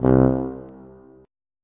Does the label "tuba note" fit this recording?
Yes